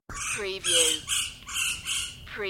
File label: Wild Bird